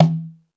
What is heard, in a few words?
Jungel Percussion, E-MU Pro-Cussion; drum machine